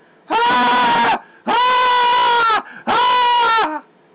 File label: aaaahhh